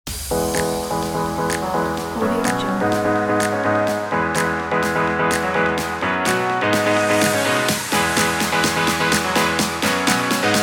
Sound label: edm fun party; magmasounds